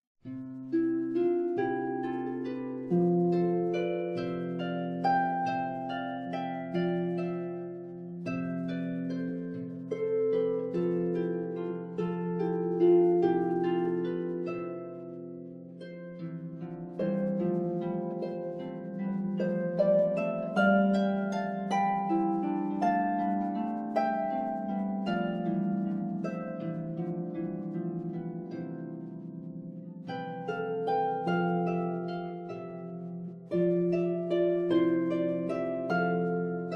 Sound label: Sweetheart Waltz, harp